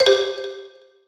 PUZZLE Success Xylophone Two Note Decline Bright Wet; stereo; Universal Sound FX